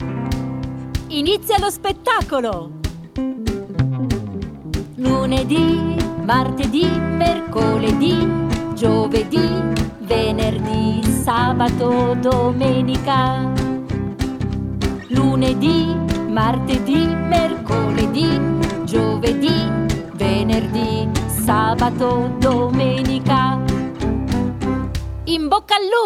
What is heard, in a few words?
song italian